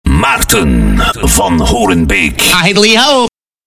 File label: jingle